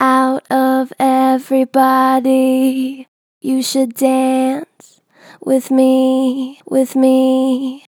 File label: Natural Vibrato Gentle, Harmor Vocals